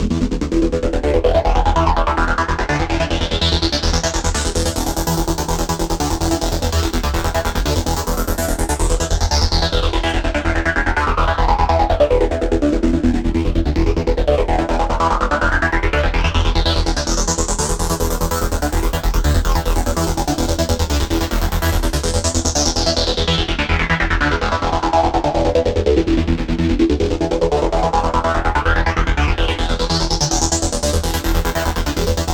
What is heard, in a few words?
Gentle Waves Lead Line E 145 BPM; Leads